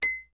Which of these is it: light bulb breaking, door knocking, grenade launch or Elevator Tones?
Elevator Tones